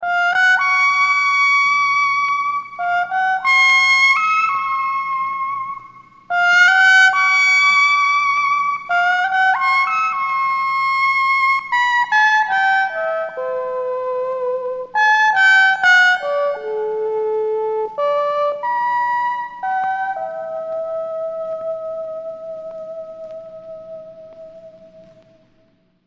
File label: Sax